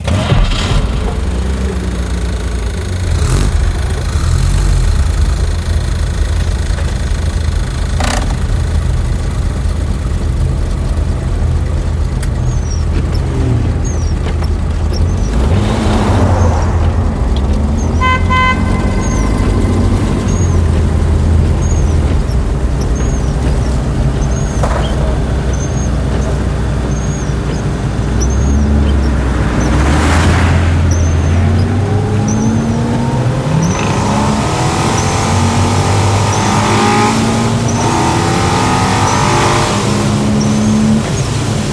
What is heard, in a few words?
Antique Automobiles; PSE; The Producers Library